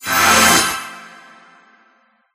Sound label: Save; Life of a Naomi